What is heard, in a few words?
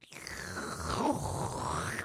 Slurp